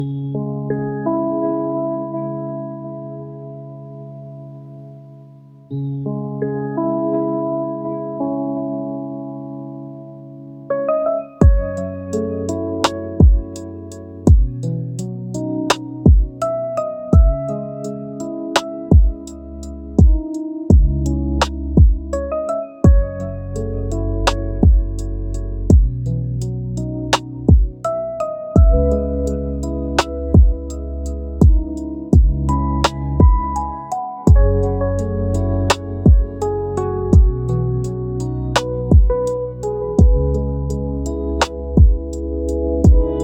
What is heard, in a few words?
focus lofi